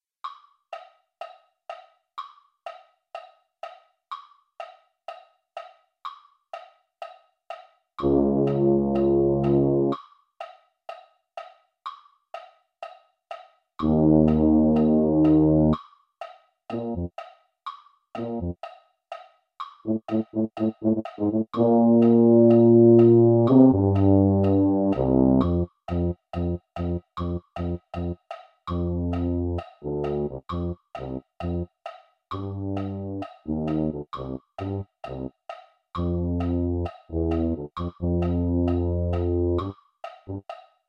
tuba; concert corps

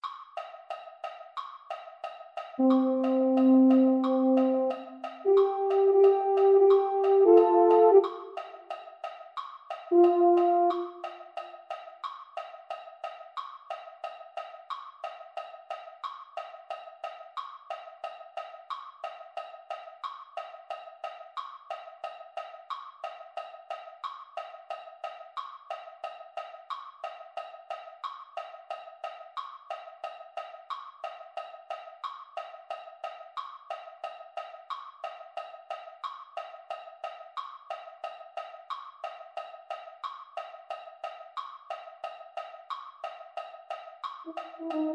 baritone; holiday brass

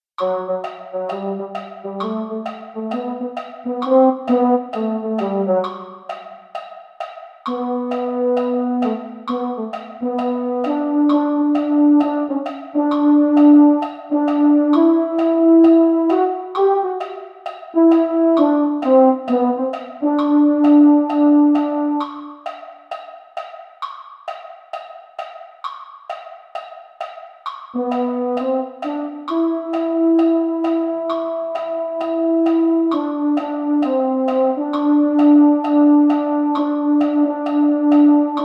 baritone; concert corps

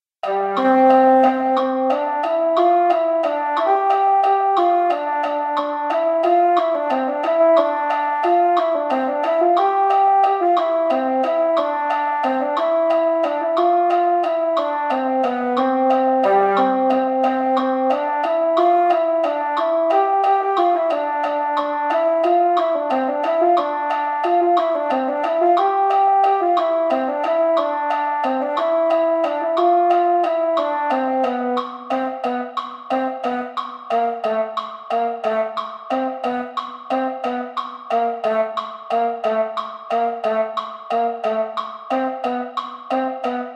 wassail mellophone; holiday brass

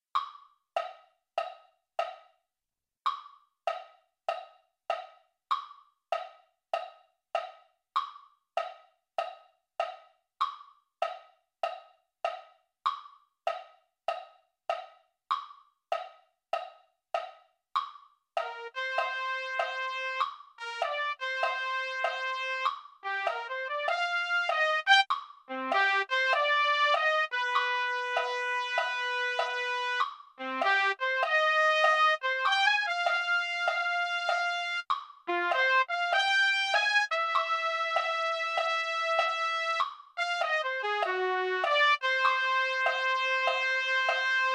trumpet; mini corps

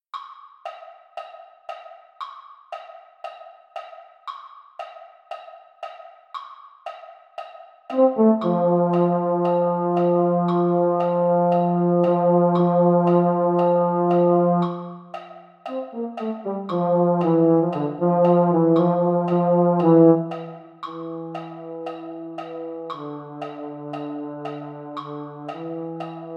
bariitone, patriotic brass

